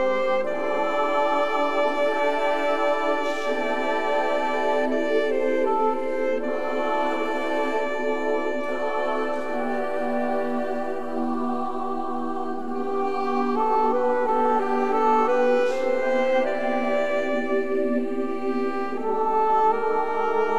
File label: song; pearlygates